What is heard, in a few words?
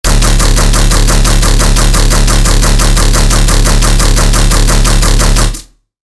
Bass; Extinction; Routed Sound; Vital Expansion - Chaos Bringer Vol.1